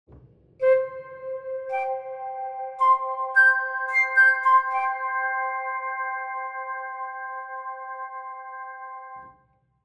Flute U4 Tall FX

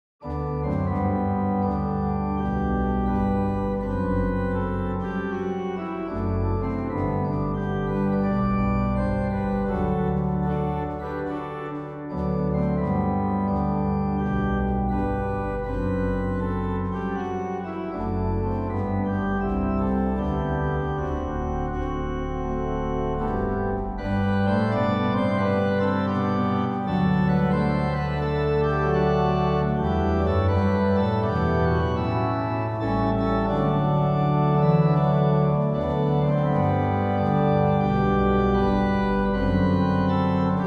Historical Organ Chorale; Hosianna; French; Puget

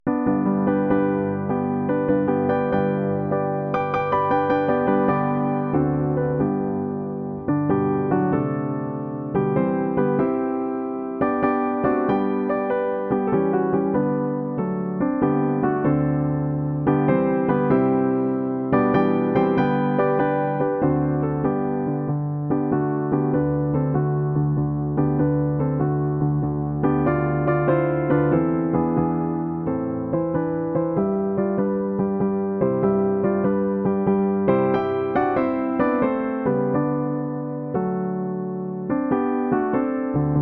mendelssohn morning song bluclav

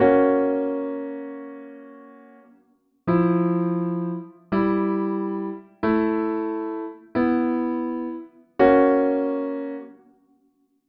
grandeur

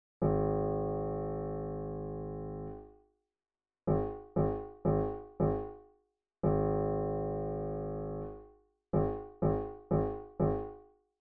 test damper noise

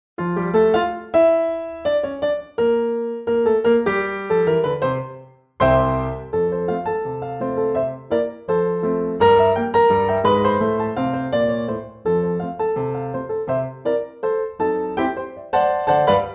Bright Wet

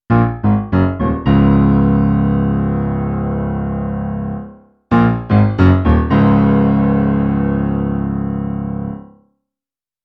compare D4 to D4-P80 mix

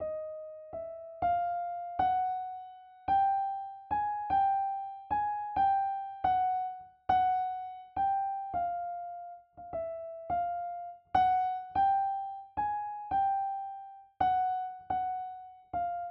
Steinway D Classical Modified Preset Test F#5

